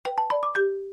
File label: Quest Complete